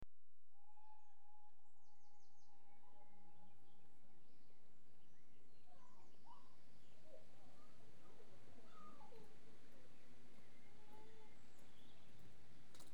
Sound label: Train Arrives Right; BBC Sound Effects Library; Age Of Steam